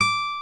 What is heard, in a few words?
6 STRING GTR; OMI - Universe of Sounds